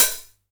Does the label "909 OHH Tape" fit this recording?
Yes